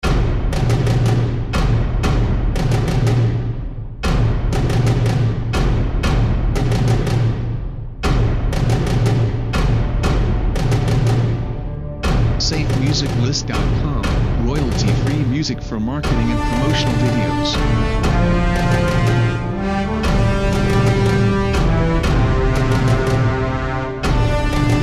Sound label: Heroic Drums; SafeMusicList